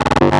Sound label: Transistort Grid Fragment G 145 BPM, Psytrance; Grid Loops